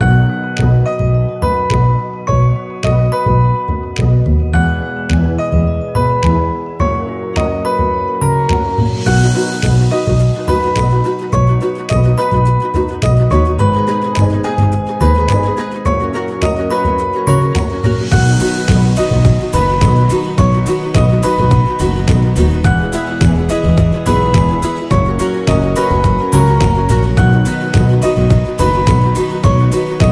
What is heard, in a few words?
Kitchen Background; cooking show